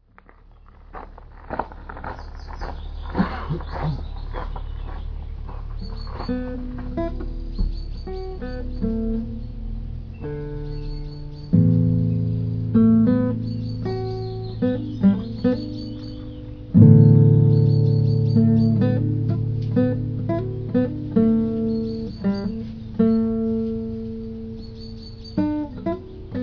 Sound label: celtic lament short